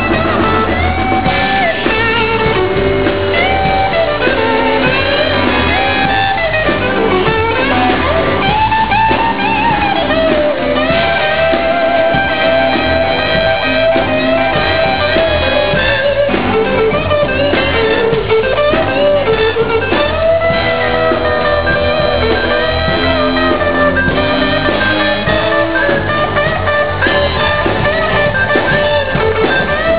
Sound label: guitar; California; Marin; ClosingNite